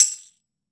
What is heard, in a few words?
TAMBOURIN; AKAI S-Series CD-ROM Sound Library VOL-5